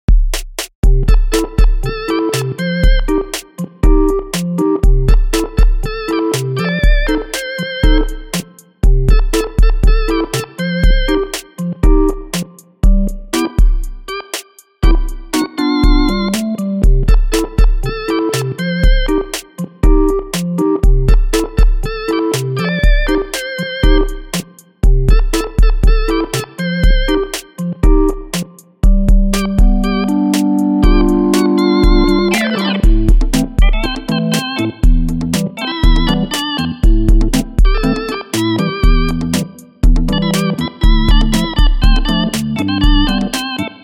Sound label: ball music